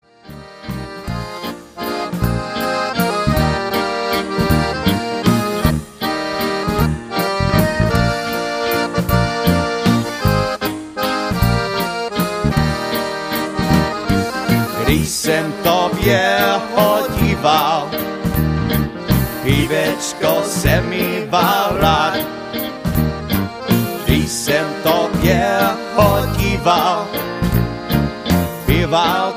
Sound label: Waltz